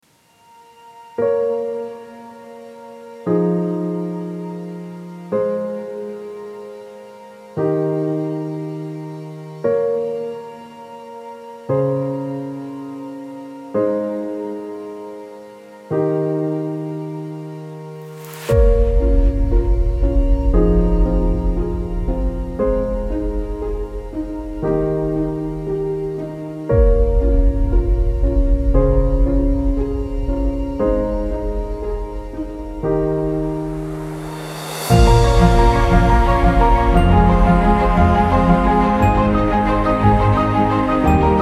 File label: Documentary Music